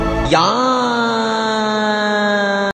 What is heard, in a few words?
trex man yaaaa